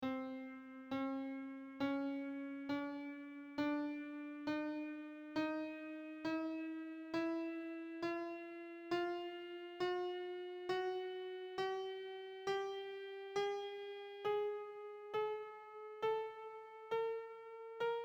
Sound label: 24-TET Quarter tone scale on C